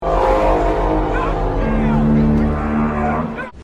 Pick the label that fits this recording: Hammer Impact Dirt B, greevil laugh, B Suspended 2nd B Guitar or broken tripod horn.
broken tripod horn